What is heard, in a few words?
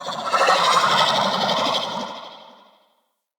Corrupted Slug Vocal Death